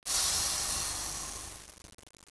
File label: Air, Train; Expreso AZAFT Tren Azul